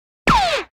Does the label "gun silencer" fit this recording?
Yes